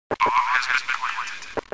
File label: bomb plant